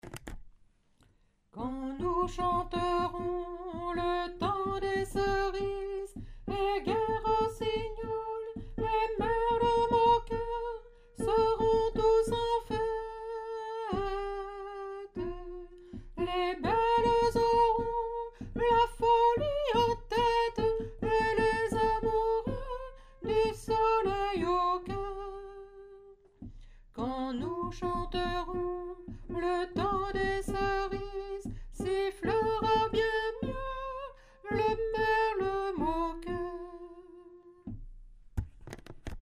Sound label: soprano, chorale; le temps des cerises